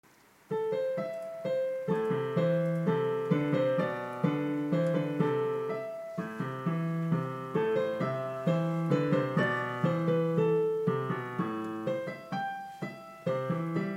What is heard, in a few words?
piano wave